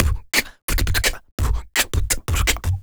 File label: Human Beat Box, Drum & Bass; Loopmasters